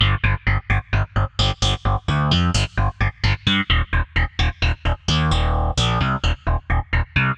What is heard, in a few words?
G Bass FM Phaser, 130; Zero-G; Future Wave